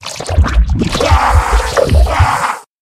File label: Zombie Convulse; criminality